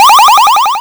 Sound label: Bonus sound; Blastmorph Pinball; Xbox Series X/S